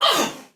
Pain, Soldier Female, Battle; Sinecure

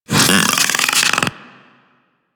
Infested Splitter Top Vocal Attack Medium; Warframe; Grey Strain

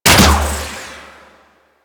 Antler Bow Fire; DE; Warframe; Weapons

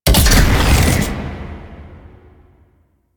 Layer Alt Shot B, Shotgun; Phantasma Prime; Revenant

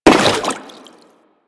Crewman Step Water, Footsteps; Warframe; Corpus

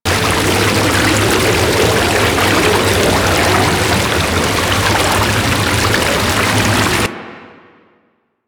Water Fall Loop; Ambience; Interior; Warframe; Orokin

